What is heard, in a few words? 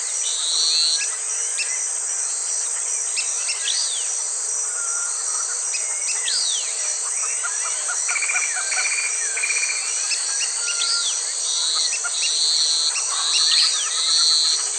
jungle exterior